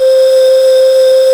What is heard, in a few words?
BOTTLE BLOW; AKAI S6000 CD-ROM - Volume 1; BrassWind